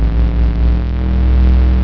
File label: POWER RUN; EFFECTS